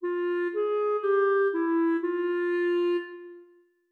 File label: 5 notes F min